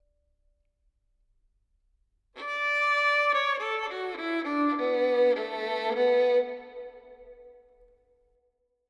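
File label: Verb, Violin, 4-4, D minor, 100 bpm; Melody; Sample Banks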